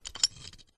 metal sequence slide gear change mechanical gear mechanism accent; ancient game